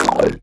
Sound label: Mouth Bass; Aawaz; Gully Loops